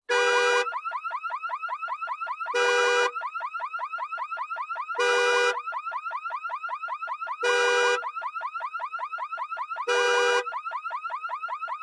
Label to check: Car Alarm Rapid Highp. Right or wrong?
Right